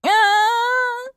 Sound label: Cloth Death